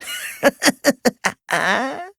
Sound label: laugh; servant